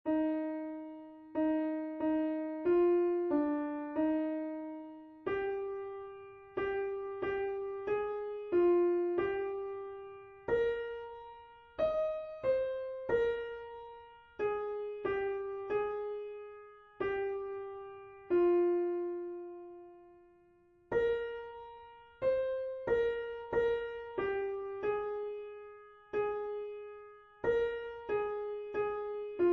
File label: Beautiful Savior Melody; worship